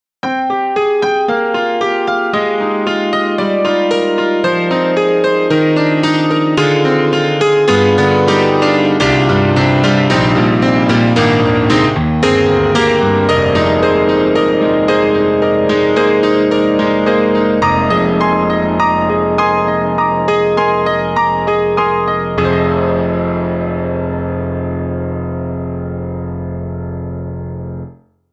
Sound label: Piano; KORG; KRONOS 2